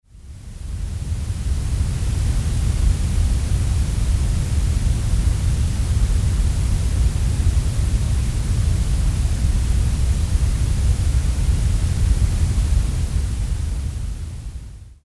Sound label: grey noise analog enhanced